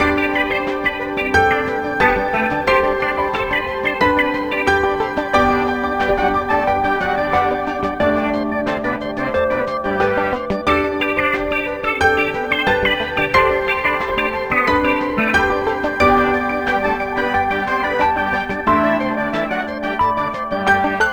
Water; Heroki

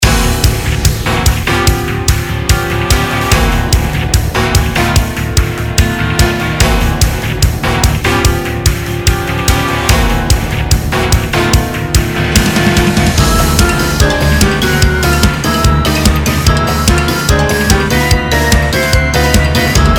Raid Boss; mist train girls android ios mobile gamerip 2020

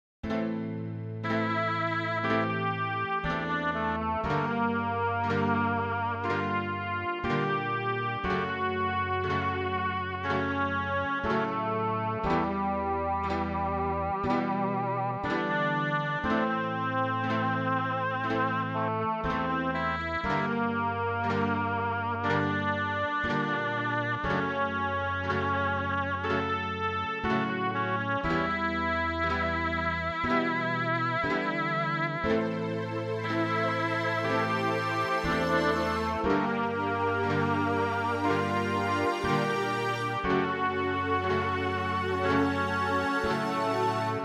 MIDI Dungeon

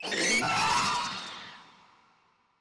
Roar Monster

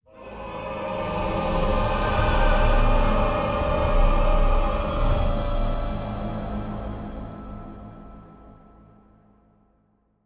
Battle Intro; Hell Warders

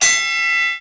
Timesup; Charlotte's Web: Wilbur and Friends

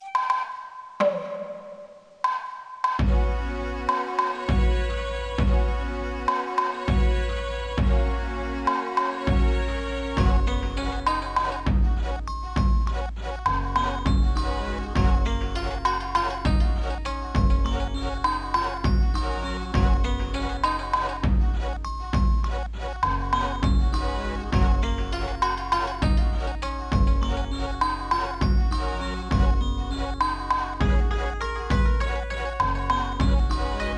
credits loop; Vasara Collection; PS Vita

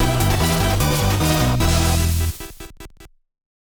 music secret

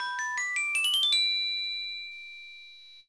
Victory Tuning forks; Nancy Drew: The Mystery of the Clue Bender Society; DS gamerip 2008

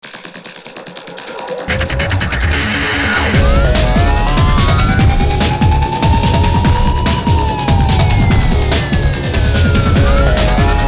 Music - Jingle; bet on soldier blackout saigon

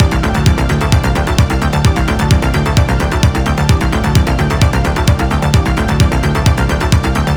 Level loop; Level B Master B Part 2

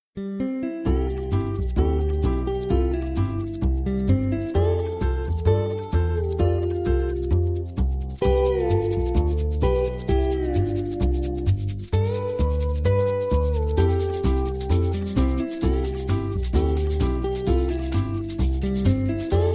Tropical; Spongebob

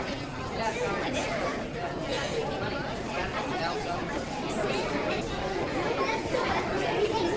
kids talking ambience, Spanish

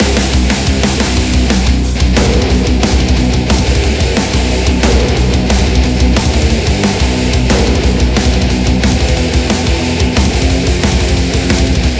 Music Song Loop; Hellbound

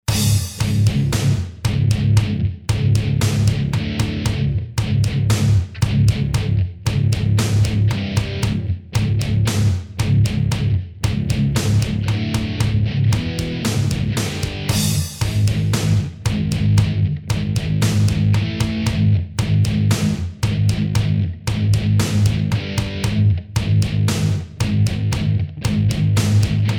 Background; Dragon Ball Source

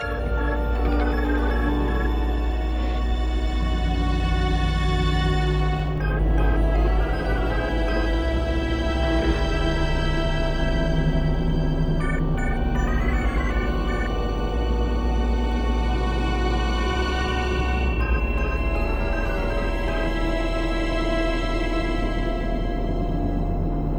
Steelworks Slow; rive wreck hack die retry; ps4

